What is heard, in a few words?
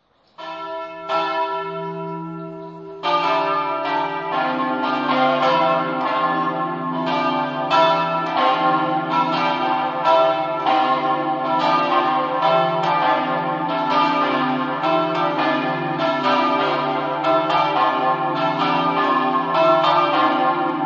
campane; tavagna